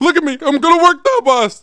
get shot; Messiah

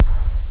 electro; OctaMED SoundStudio V1

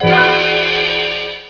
Potion Good; Mythos

